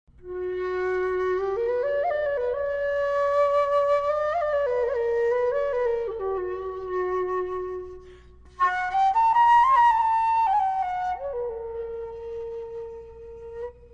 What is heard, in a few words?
Arabian Medium, Arabian Flute